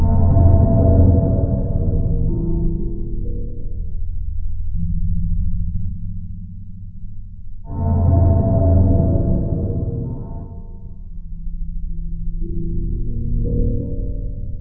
hotel hall; grimthane

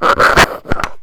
scratching; svencoop; rustworld

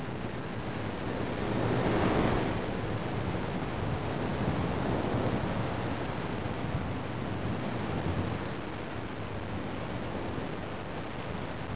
moan, Halloween

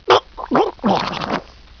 die; svencoop; decay

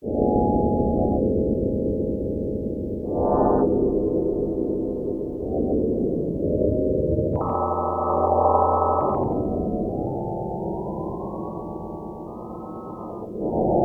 place red loop; svencoop; rustworld

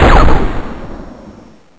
mac10 fire sil

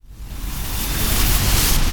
fading; svencoop; rustworld

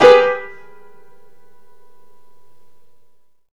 piano

